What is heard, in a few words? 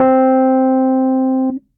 Basic Suitcase DI, Suitcase Piano; Electric Keyboards; Ableton; Factory Packs